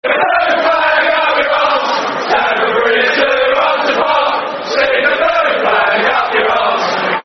Stick The Blue Flag, Football Chant; West Ham WHFC

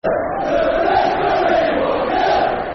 Football Chants, Soccer Songs; One Nil To The Referee; Wigan Wafc; fanchants

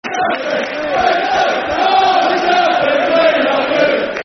Football Chant, Soccer Songs; We Love You Southend; Southend United Sufc

